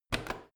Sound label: washing machine rotary button press hard